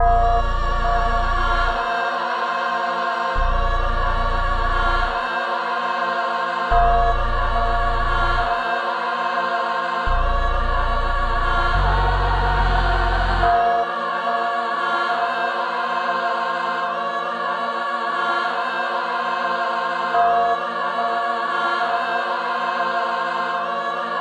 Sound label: 143 BPM A# Min, Melody Loops - Drill